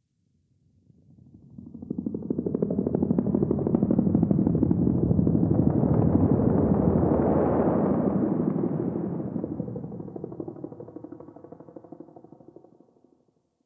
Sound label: Distant Ambient Helicopter; Ambience; Warframe; Nineteen Ninety Nine